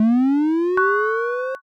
cast start

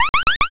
monkey

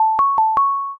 washer done

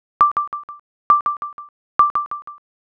ecg

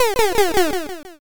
laugh single

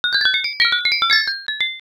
medal

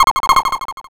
frog

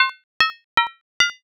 pinball

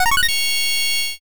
levelup big

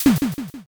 play card

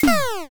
trap

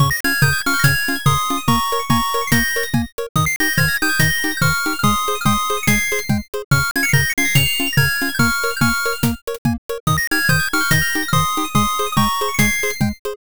bgm bright

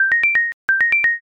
mobile ring

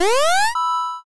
tete comical

